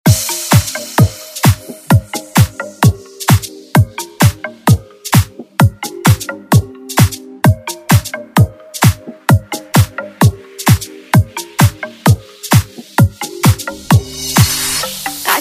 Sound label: House